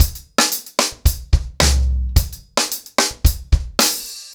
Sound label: 110BPM, ACOUSTIC DRUMS